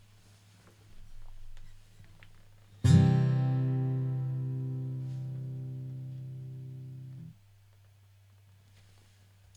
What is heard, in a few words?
C strummed, guitar